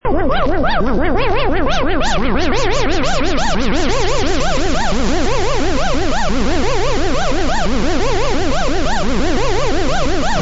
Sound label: breath